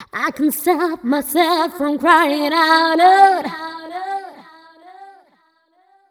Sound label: f 128 mel e, fem Vocals